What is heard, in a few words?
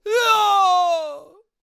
Devout Gauntlet Death Vocals